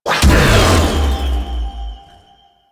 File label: Stomp Impact; Warframe; Caliban